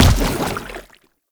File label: Chem Strike Step Default, Footsteps; Enemies; Foley; Warframe; Lasrian Soldiers; Lasrian Chem Strike Nox